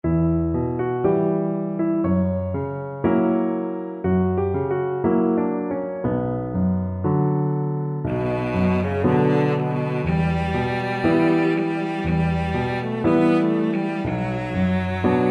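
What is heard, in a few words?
violoncello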